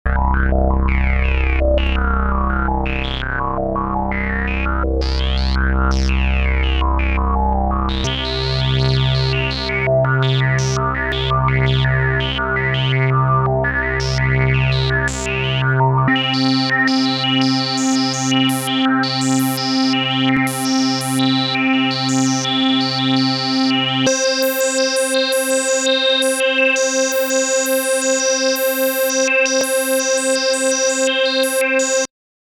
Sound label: CAT dry